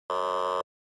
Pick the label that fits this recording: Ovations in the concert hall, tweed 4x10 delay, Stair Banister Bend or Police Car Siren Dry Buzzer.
Police Car Siren Dry Buzzer